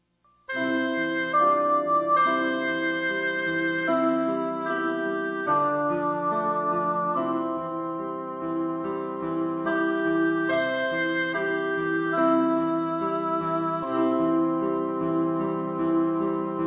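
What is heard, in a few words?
sunset oboe piano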